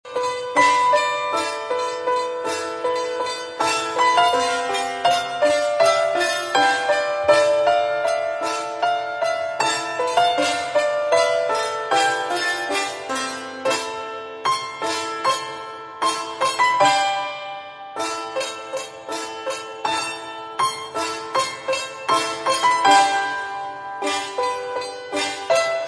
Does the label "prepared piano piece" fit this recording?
Yes